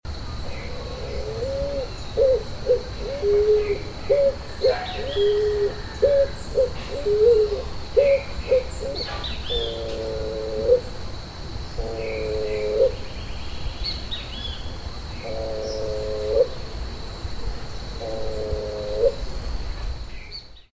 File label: Patagioenas picazuro Asa branca